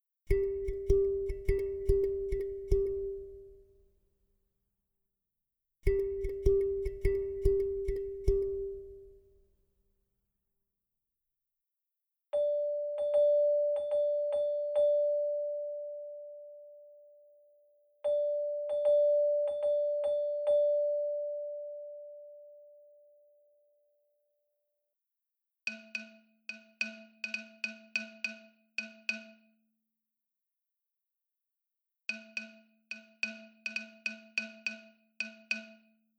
Rhythmic motifs on single pitch (the same each time)